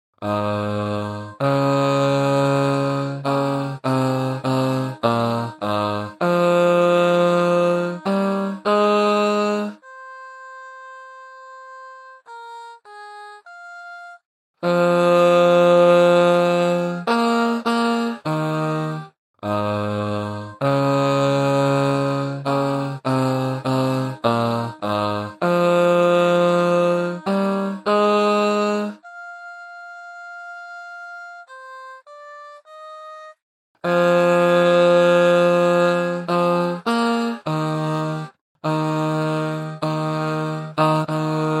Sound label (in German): Gute Nacht Bass; Schumann; chornacht 2026 uebungstracks